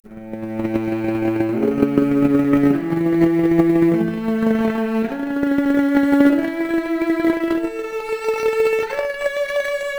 Violoncello arp, mp Stereo output noised, 3bit

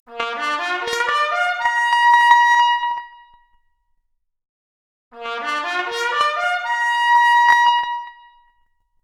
Trumpet arp, Stereo output noised; 4bit

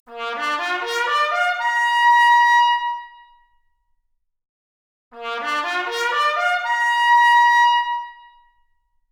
Trumpet arp, Stereo output noised; 3bit

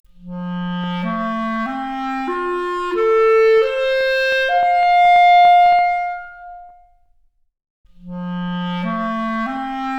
Clarinet arp, Stereo output noised; 3bit

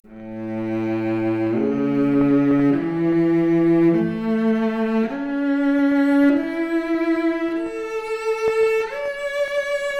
Violoncello arp, Stereo output noised; 4bit

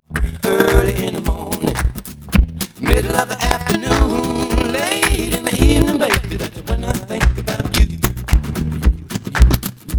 noised; 4bit

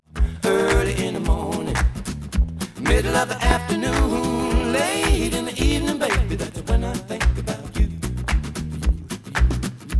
Stereo output noised; 4bit

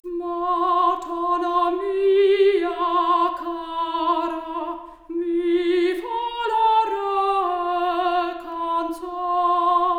Soprano Stereo output noised; 3bit

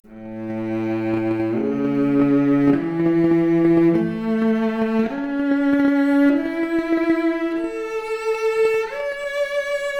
Violoncello arp, mp Stereo output noised, 3bit

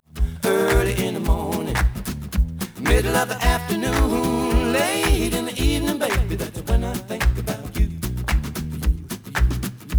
noised; 3bit

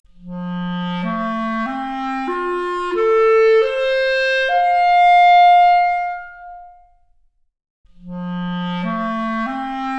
Clarinet arp, Stereo output noised; 4bit

